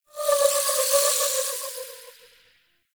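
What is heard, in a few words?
Gyromitra Shroom Projectile Whiz By; Warframe; Nokko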